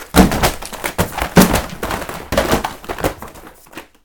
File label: many books; horror